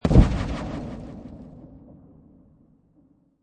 Magical Burst Explosion, Magic sound of casting spell, conjuring or wizardry; Lynne Music